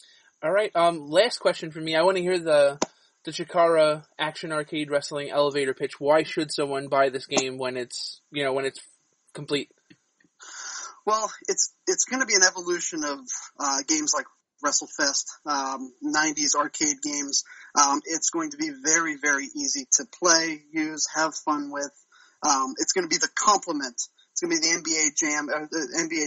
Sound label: Horn